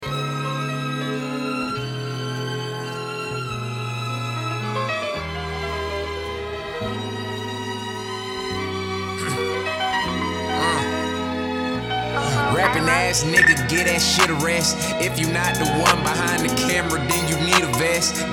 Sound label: chorus only; SMASH CHALLENGE